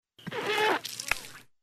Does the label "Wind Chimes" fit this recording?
No